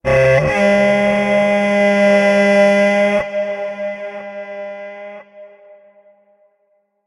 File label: Battle Horn; Middle-earth Shadow of Mordor